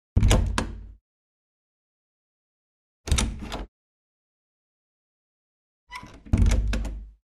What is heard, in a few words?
Door Wood Open, Close x3